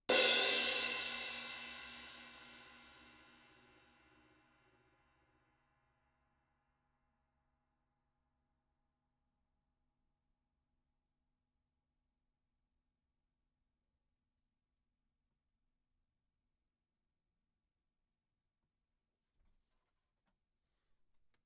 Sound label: crash L, drums; film